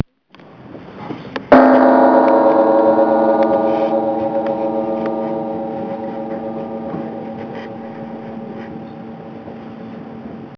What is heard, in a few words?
gong; A gong an ancient Buddhist monestary in Mongolia; recorded on my trusty Psion